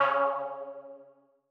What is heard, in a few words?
Lead C#3; KG MegaSaur